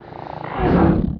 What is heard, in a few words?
antlion attack muffled single; hl2 ep1; npc, antlion